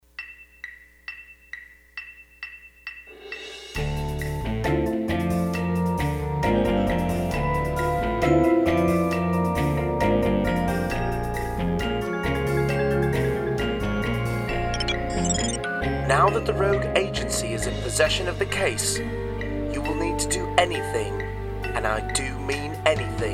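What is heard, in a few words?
click track simplified percussion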